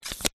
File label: household knife slide in plastic protective cover sheath